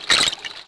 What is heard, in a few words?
Underwater menu command; windows 98